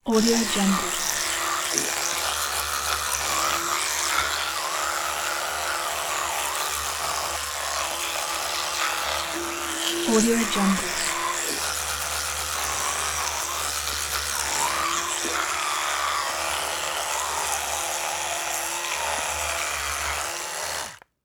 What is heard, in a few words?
electric toothbrush brushing teeth; soundjay